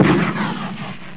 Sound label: rock